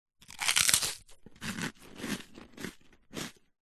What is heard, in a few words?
human eat bite crunch munch toast; zapsplat